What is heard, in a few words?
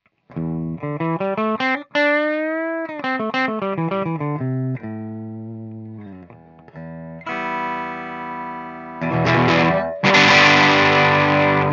Clean Bridge Humbucker Dynamic